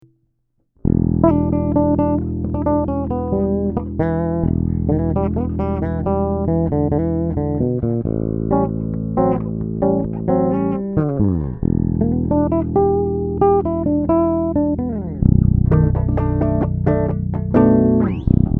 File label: Blues Noodlin passiv; Harley Benton BZ 5000